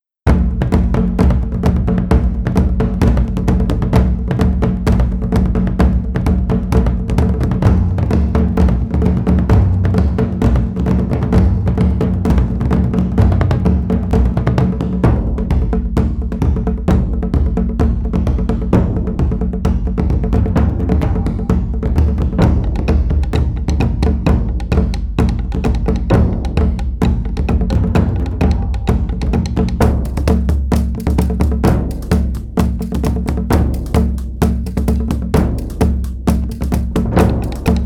Hawaiian Percussion Thrilling Adventure

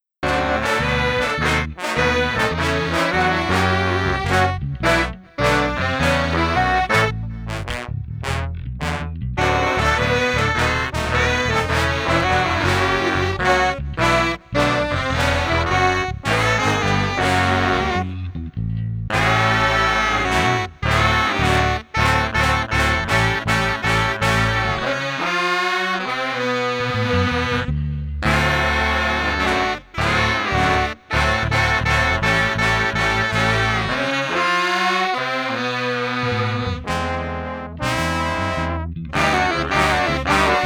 Street Brass Ensemble Tipsy no Drums 105

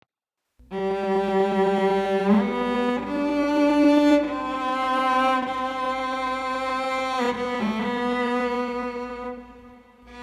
Street Cello Solemn 105

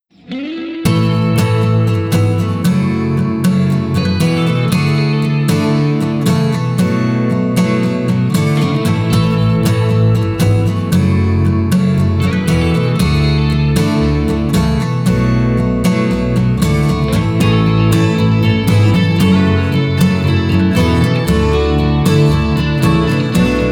Exciting Moments NO DRUMS 116bpm

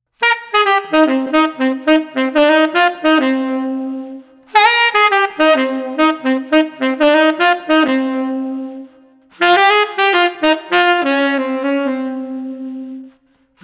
Street Sax Playful 100

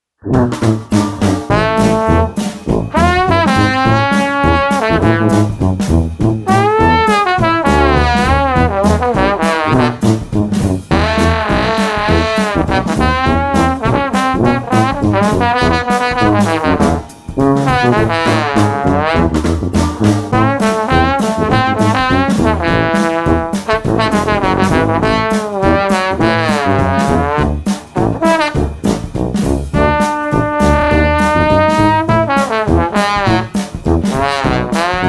Street Tuba Trombone Drums Bouncy 102

